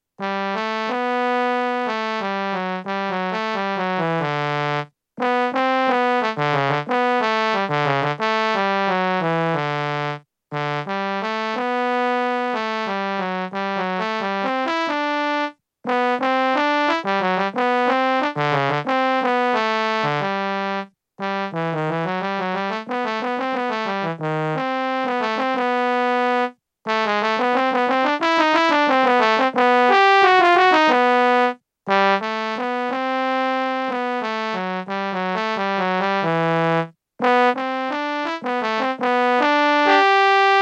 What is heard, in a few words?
symphonic baritone